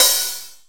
Jomox Xbase888

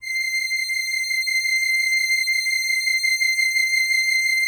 LAMBDA, String Machines

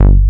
BIG BASS; Hip Hop; E-MU Formula 4000 Series Vol. 1; Hip Hop Nation; Phatt 4000

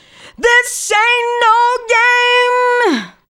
Female Hooks; Sound & Vision - Gigapack II CD 1

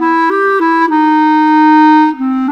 Clarinet; Sonic Foundry (Sony Creative Software); Orchestral Series VOL-4 - Rock and Pop

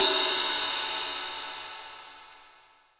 TIP, 18 ZIL RIDE

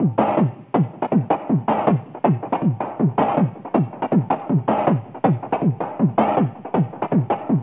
whine beat; Zero G; Malice In Wonderland

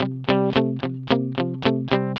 ROCK C 110, Vintage Blues Guitar; USB Soundscan vol.22; AKAI; ROCK2

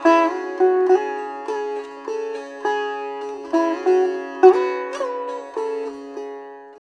SITAR; Spectrasonics; Heart of Asia

